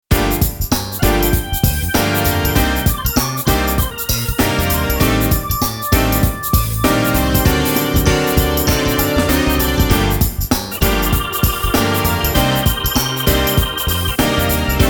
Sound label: Kronos OASYS Gospel Mix; Organimation